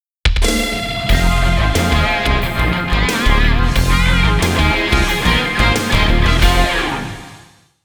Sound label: Rock; Shin Megami Tensei - Devil Summoner Soul Hackers